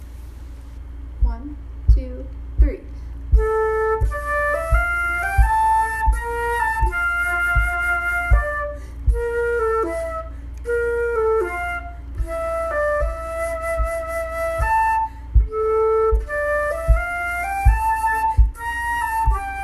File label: oboe